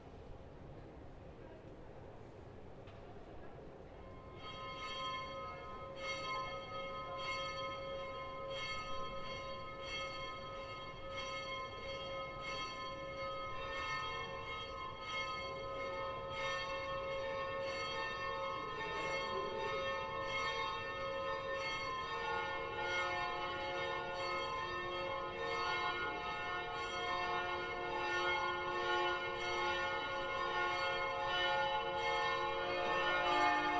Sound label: bell plenum; southtower